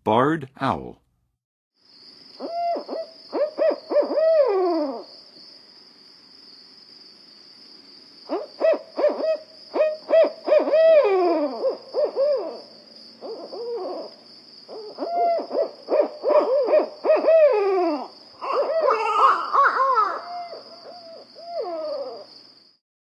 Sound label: Barred Owl; North America; Western USA; Western USA Songbirds